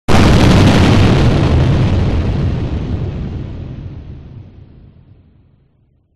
explosion enhancer massive heavy ott distorted boom; zapsplat